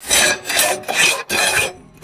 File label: rapid metallic shovel blade scraping